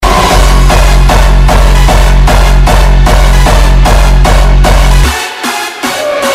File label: Beat